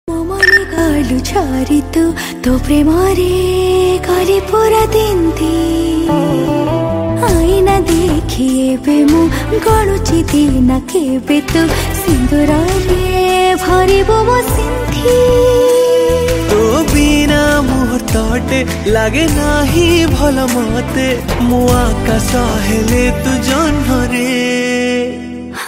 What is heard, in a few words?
Odia Ringtone